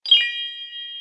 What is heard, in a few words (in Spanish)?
magia; juegosdidacticos; jug-alquimistas